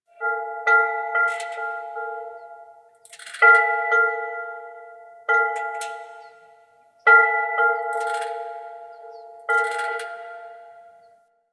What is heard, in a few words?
Lift Idle Loop; Duviri